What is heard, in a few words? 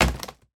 books fall on floor; household; sound effects; sound effects one